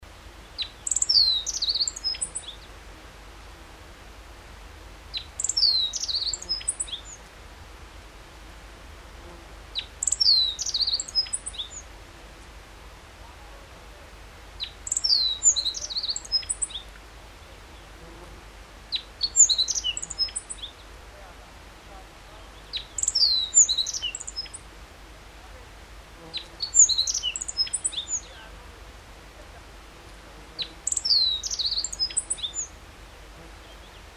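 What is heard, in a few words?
Emberiza cioides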